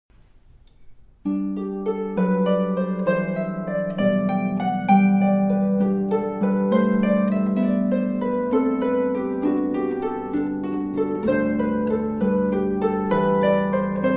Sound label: Harpist; Houston; Canon in D